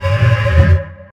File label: centipede landing; fowl-play; elder-centipede; enemies